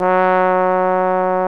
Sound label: BONE, TROMBONES; Greytsounds VOL-1